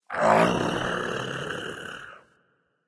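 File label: Movement Growl; smite; god bakasura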